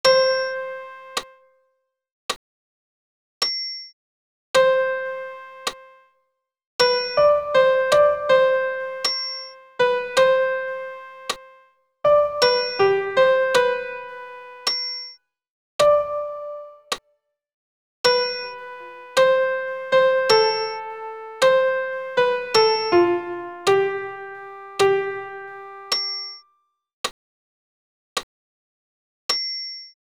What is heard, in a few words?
Kyrie sop pian seul